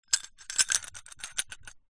household broken ceramic cup pieces movement inside cup